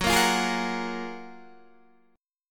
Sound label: Gb Major 9th, down, Guitar, DADGAD